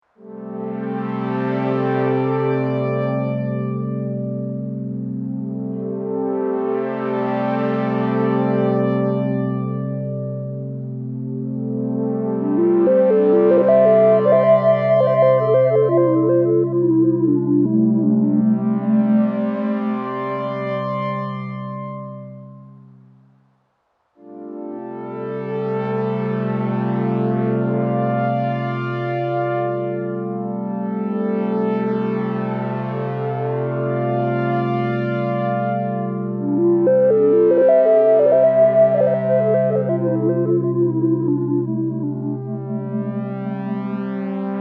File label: tambourine